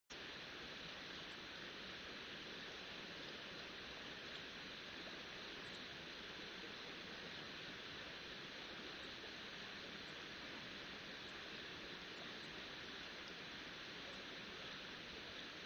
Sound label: riverside; Kyoto U; Ashiu